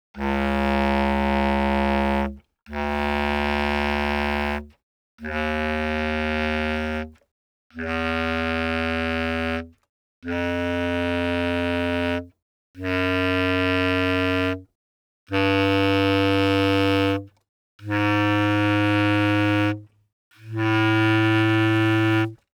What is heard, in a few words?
bassclarinet; uiowa